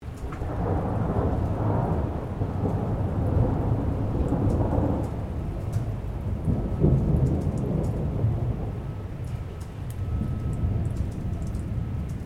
THUNDER DISTANT WATER DRIPPING STEREO, Severe hail storm